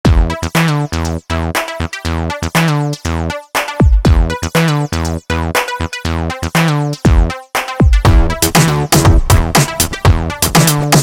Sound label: old groove